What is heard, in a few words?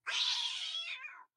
hit; wilkins; Blind Drive